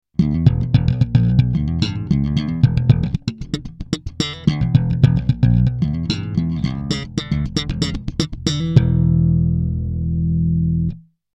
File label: MAXON CP9PRO+ Bypass